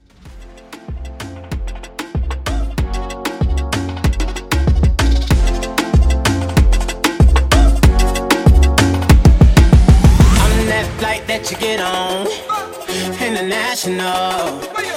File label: Reggaeton Hybrid Mashup